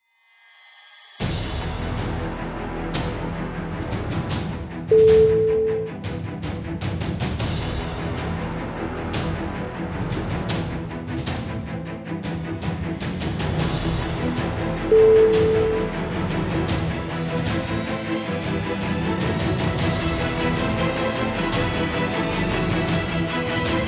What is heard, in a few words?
epic intense trailer intro